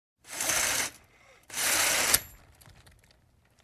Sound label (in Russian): zvuk zhaliuzi